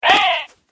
raven wound

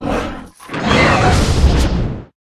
Rat die

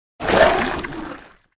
skolopendra attack voice mid

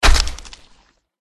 yasherogriffon attack contact middle

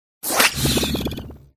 werewolf

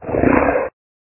tiger attack

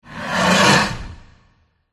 dragon blue attack voice

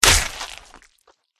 tiger attack contact bottom

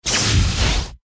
Light Spirit Dodge

